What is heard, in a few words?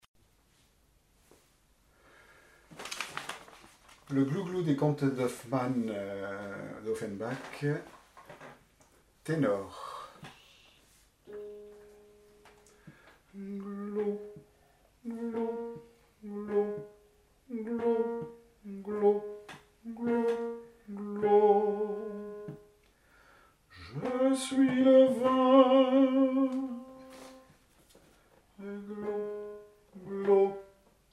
tenors